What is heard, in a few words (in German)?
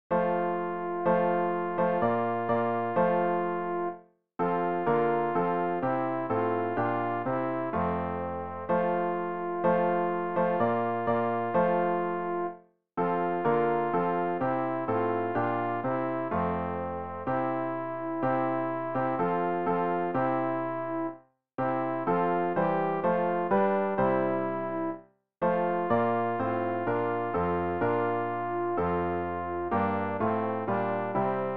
alt, Übehilfen für das Erlernen von Liedern; es segne uns der herr